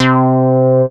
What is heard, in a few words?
MOOG BASS C4; Best Service Dream Experience